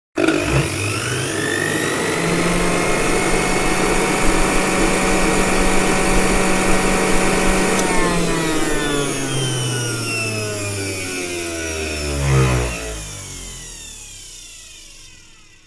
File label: Juicing; آبمیوه گیری